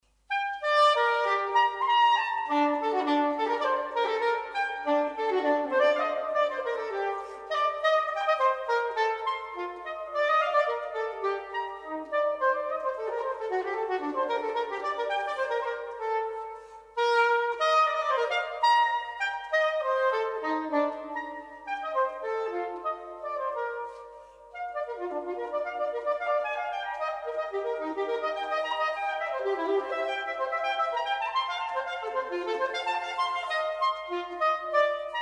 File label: Flute Sonata in A Minor, Allegro